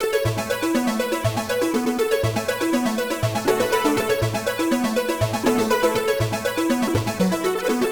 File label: 121 F# Dry; MELO + FILTER; RoBKTA Sample Pack Supreme